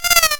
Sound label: raindrop